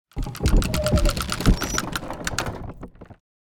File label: Catapult charging medieval crackling